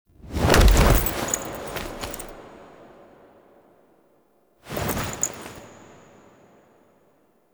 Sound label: Drifter Foley A; Warframe; Quests; The New War; Sealab; Hunhows Lair; Hunhows Lair Part One